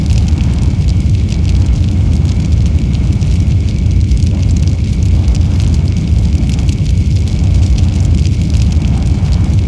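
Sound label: ambience main, incinerator; hl2; citadel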